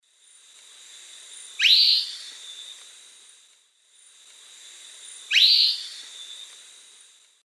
Philydor erythrocercum, call; S. America; Eastern Lowlands

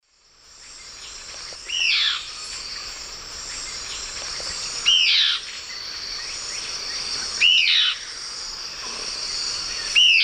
Myrmoborus myotherinus, scold; S. America; Eastern Lowlands

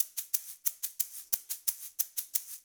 Egg Shaker; Rock; Percussion

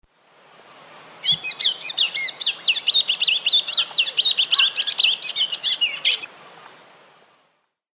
Oryzoborus crassirostris, song; S. America; Eastern Lowlands

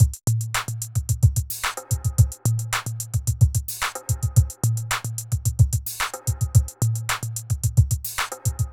r n b kit 110

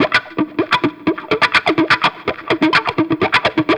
Guitar A min, Rock

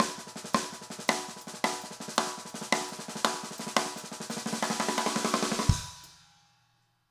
jungle 170 fill; Sound Effect Library

